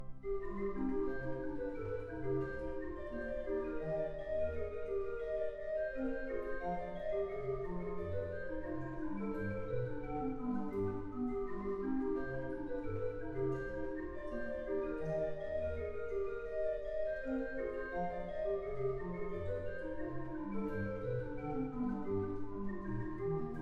Partita; O Gott, du frommer Gott, BWV 767 - Partita IV; J.S. Bach Chorale Partitas, BWV 766-768 & 770

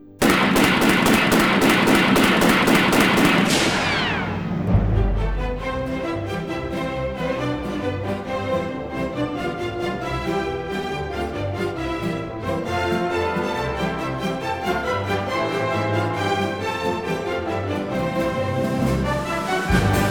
Hoedown, for pops orchestra

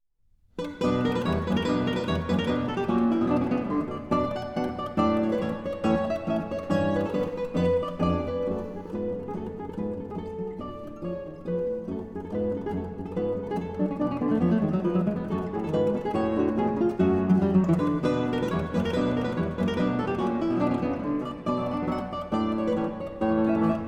Trio Sonata, Allegro; RV 85

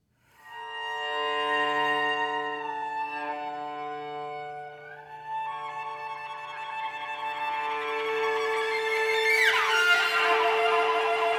Calmo, con tristeza, Solo Cello

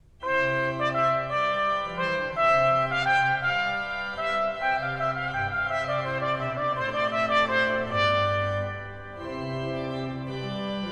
Warlike Consort, Trumpet and Organ; King Arthur Z628

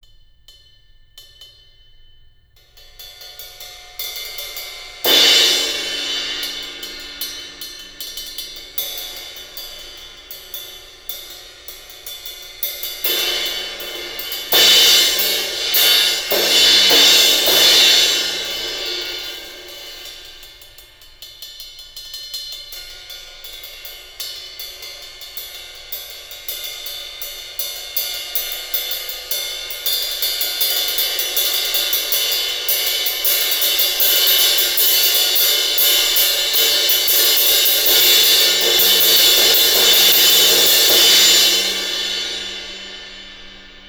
Cymbal Dynamic Range Heavily Compressed Test; The Ultimate Headphone Demonstration Disc ( 24Bit192kHz ); Chesky Record

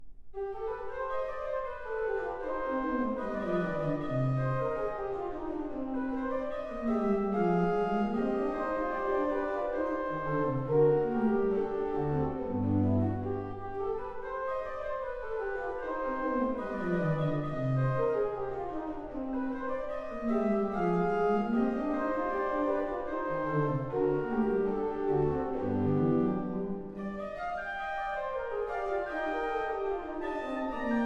Partita; O Gott, du frommer Gott, BWV 767 - Partita V; J.S. Bach Chorale Partitas, BWV 766-768 & 770

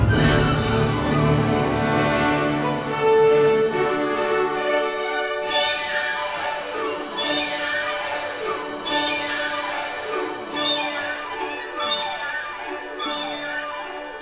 Am Wasserfall (At the Waterfall); Strauss; Eine Alpensinfonie, Op. 64, TrV 233

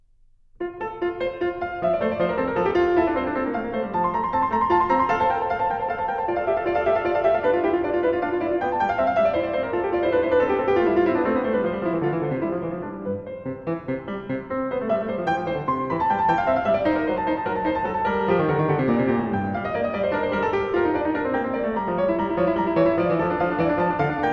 Invention No. 8 in F Major; BWV 779; Bach Inventions & Sinfonias, BWV 772-801

